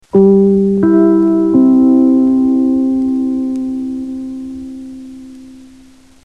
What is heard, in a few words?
NBC Chimes